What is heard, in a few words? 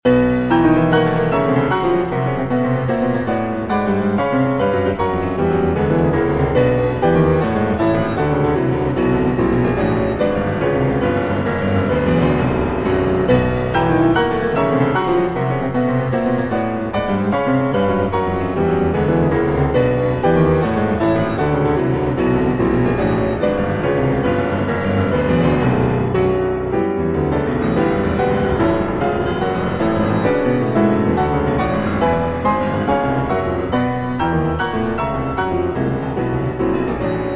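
Variations on a Polish Folk Theme, Piano Works; Szymanowski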